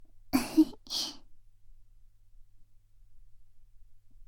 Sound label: laugh, ASMR; Garden; WithRin-Nazo01